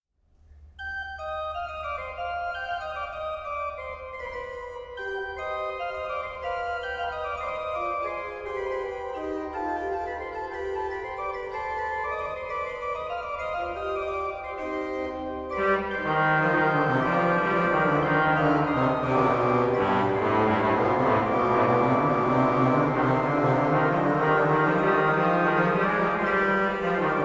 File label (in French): Basse et Dessus; Clérambault